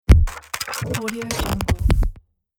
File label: glitch sound